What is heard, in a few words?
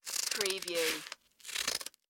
Scissors Cut Paper; sound effects bible